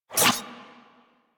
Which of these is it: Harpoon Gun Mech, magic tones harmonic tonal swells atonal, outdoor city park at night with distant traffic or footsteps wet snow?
Harpoon Gun Mech